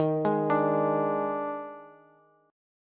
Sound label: Eb Major 7th Suspended 4th, Bass; arpeggiated; chord; JGuitar